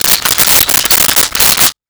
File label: Gadget, Mechanical